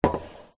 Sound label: cola can open full set down slide table; food drink; zapsplat